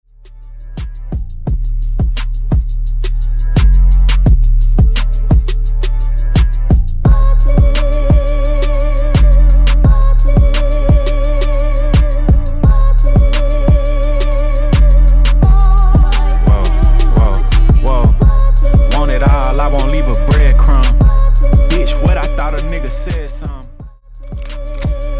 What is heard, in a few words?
Intro Outro